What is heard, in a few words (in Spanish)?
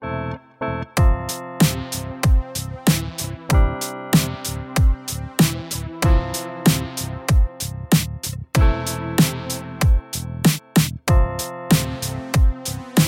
instrumental; radio3 raportajes